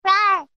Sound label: rawr